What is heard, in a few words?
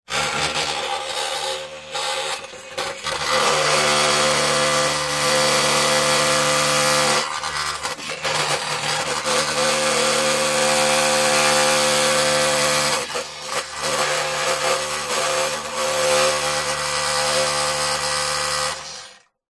industrial power tool vibrate grind metal motor hum long burst